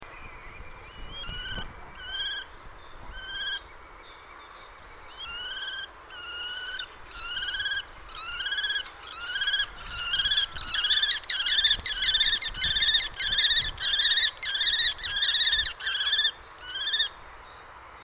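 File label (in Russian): obyknovennaya pustelga golos, common kestrel voice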